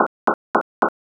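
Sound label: footsteps soil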